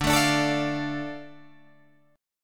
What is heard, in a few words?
D Minor D Guitar Open G